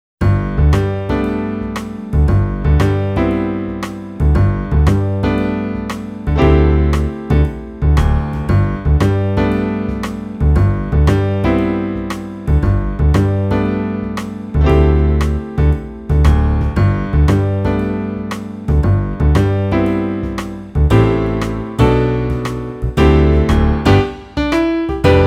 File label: Waltz, recorder; Razzamajazz Recorder